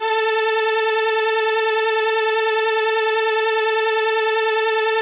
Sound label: SYN, ENSEMBLE